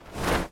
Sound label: canopy open